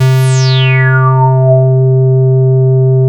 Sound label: MUUH, transwaves; Soundengine - CSR-000 The Art of Transwaves